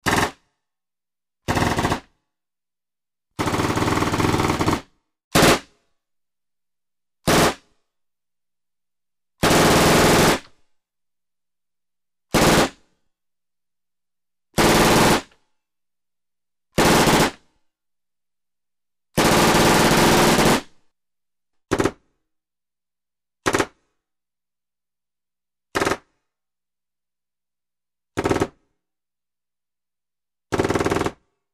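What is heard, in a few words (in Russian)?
выстрелы автомата мини узи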